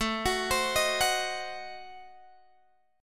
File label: A Diminished 7th A down Guitar Drop D